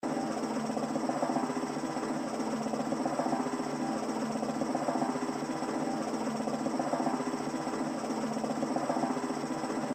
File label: REEL SPIN, slots; Marvelous Circus